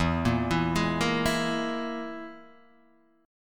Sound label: E Major Flat 5th E down Guitar DADGAD